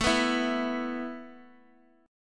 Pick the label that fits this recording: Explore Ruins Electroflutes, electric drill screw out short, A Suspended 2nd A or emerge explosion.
A Suspended 2nd A